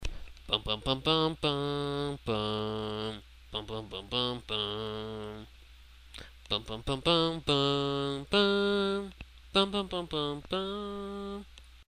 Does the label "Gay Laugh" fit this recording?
No